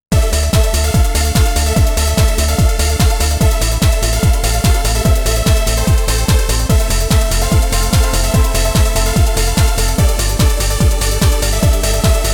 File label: Polovtsian Dances And Chorus; DanceDanceRevolution X; arcade; PS2